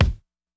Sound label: kick; funk